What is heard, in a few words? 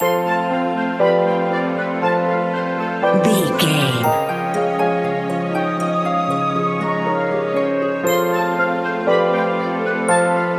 enchanted mystical forest loop